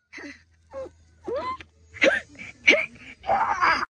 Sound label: vomit; kick the buddy